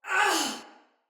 Death Finisher Soldier Female; Battle; Barks; Dialog; Sinecure Soldier Female B

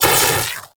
sash impact; Armillary Sash; Warframe; Nezha

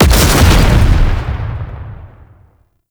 Explosive Summer; Gameplay; Warframe; Nineteeen Ninety Nine; Deep Archimedia

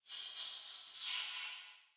Cave Ocean Creature Far

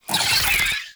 Flechette Reload; Weapons; Warframe; Tenno; Oraxia

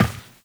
Step Glass, Footsteps, Walk; Warframe; Tenno

